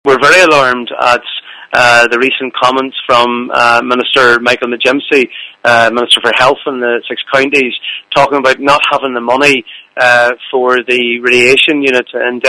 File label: pad